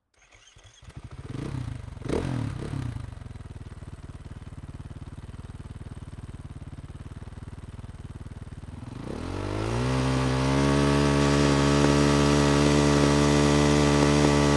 husaberg 500cc exterior startup blips idle steadies in neutral shutdown; shotgun; Sonniss GDC